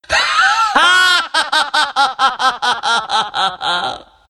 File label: necrolyte laugh; dota2